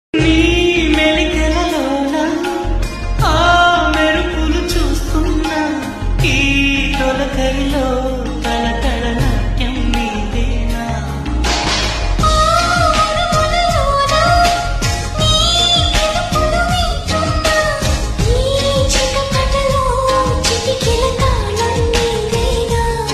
song ringtone bgm ringtones

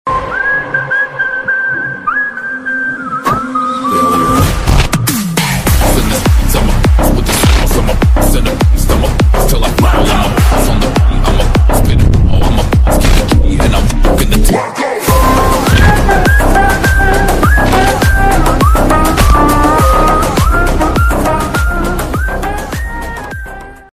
lofi bgm ringtone; marco; mark antony

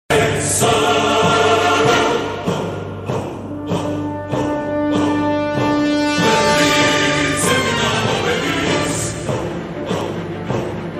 enthiran bgm robart entry ringtone, tamil; superstar ringtone

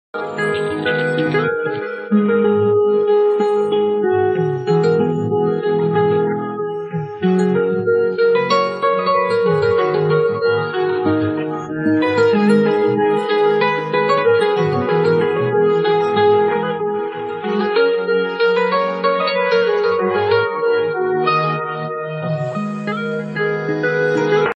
instrumental ringtone piano

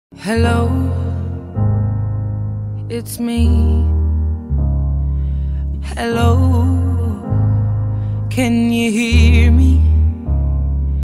ringtone loop

